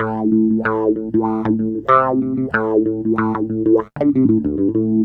WAH, WAH WAH GTR

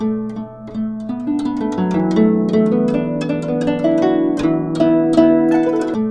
GUCHIN 80 D; INIS - Opium

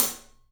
Studio Hats, 3DPercussives

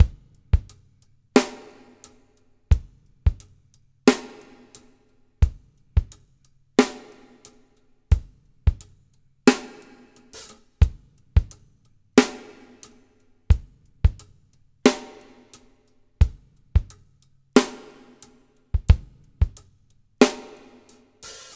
LOOSE DRMS; Spectrasonics; Liquid Grooves 1 & 2

